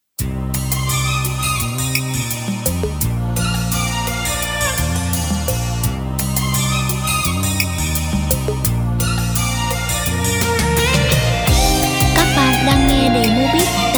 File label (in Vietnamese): beat